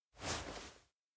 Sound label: hand offer; awayuki; anemoi パッケージ版 1.1